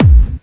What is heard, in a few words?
Bassdrums Clubby Kicks; Vengeance Samples